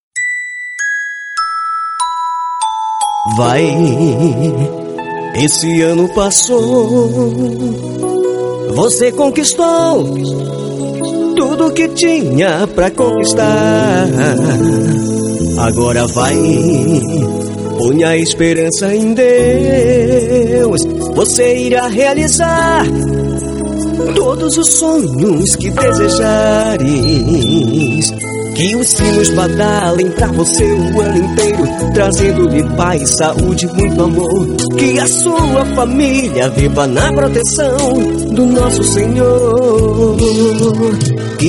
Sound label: Jingle; jingles natalinos